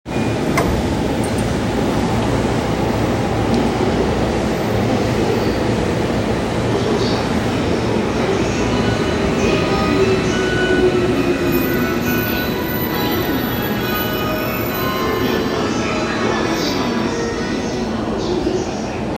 中央線快速荻窪東, train sounds

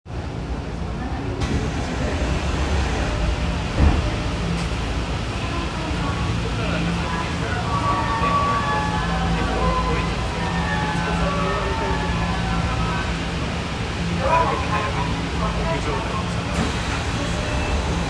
丸ノ内線西新宿西, train sounds

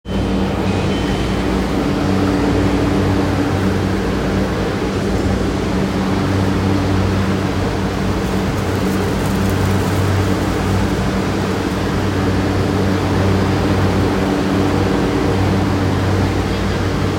田園都市線桜新町北, train sounds; 東急

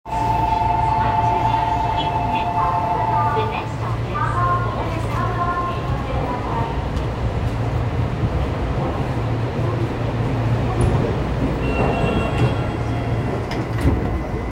半蔵門線渋谷駅東, train sounds

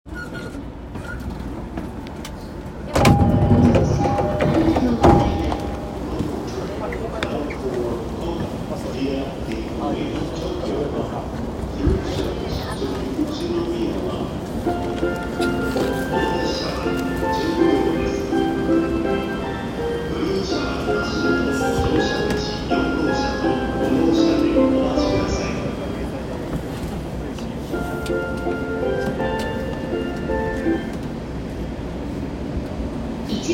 JR東海道線川崎南, train sounds

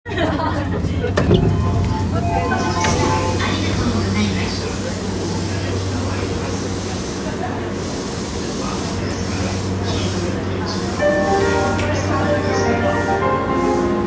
JR 山手線 田端 CCW, train sounds

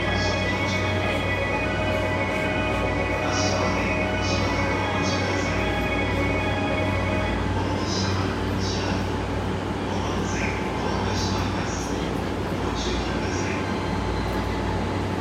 湘南新宿線 大船 北, train sounds

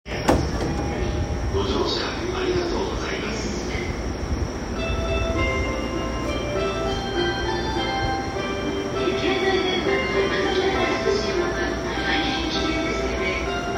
JR東海道線二宮北, train sounds

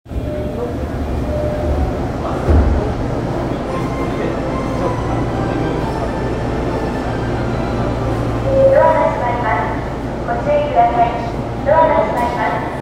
東西線早稲田西, train sounds

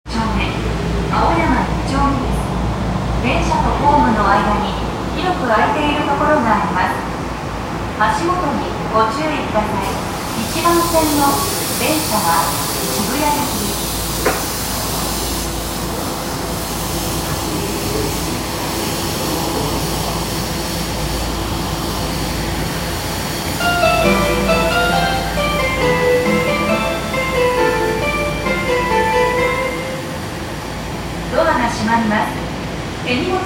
銀座線青山一丁目西, train sounds

